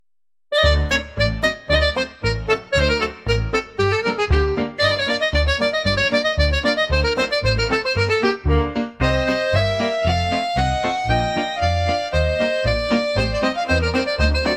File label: Klezmer